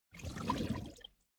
flame trail; Smite; Sol

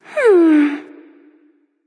thinking; smite; voicepack; jingwei

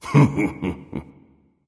heh heh; smite; susano

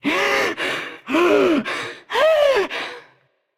breath; cupid

